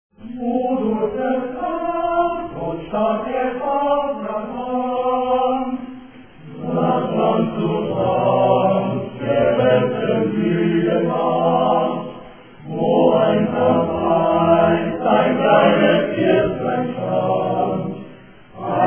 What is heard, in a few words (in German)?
Kirchschlaglied